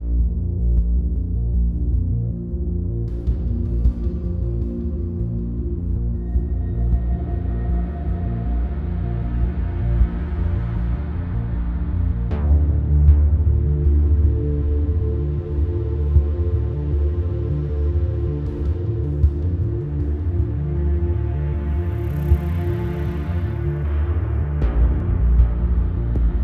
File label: background loop